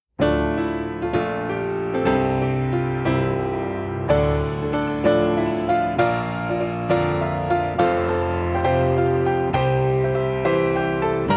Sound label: Grands Battements En Cloche 6/8; Adv 2 Ballet